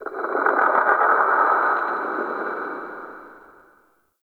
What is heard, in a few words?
Texture Mid Croak; Ambience; Dungeons; Under City